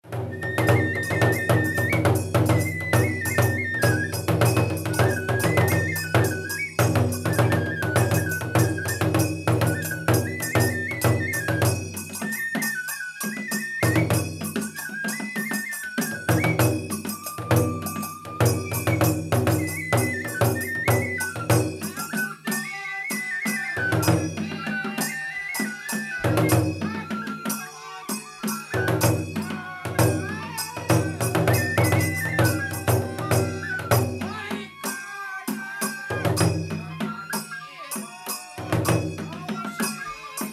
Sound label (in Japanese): 島根 神楽; 木次